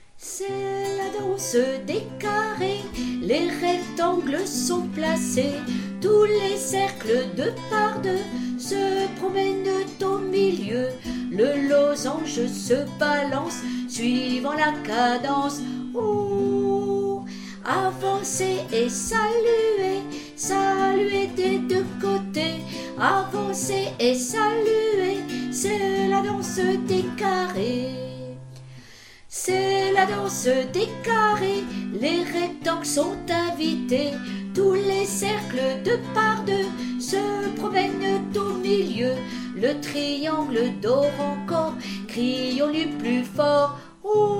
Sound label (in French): Chant